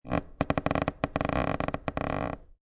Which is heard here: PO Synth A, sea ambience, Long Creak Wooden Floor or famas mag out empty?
Long Creak Wooden Floor